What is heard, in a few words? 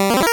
8bit se